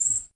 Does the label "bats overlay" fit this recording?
Yes